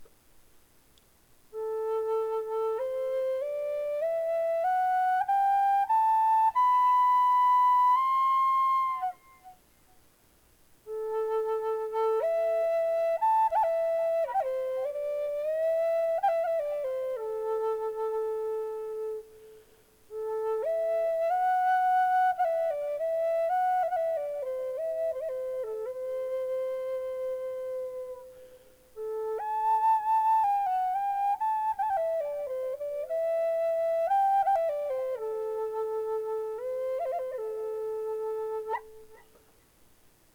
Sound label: cedar, flutes